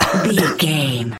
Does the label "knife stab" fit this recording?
No